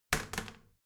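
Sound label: toilet seat lid wall open regular, bathroom; haus-garten; badezimmer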